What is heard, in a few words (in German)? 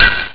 glas; Amiga Soundtracker sample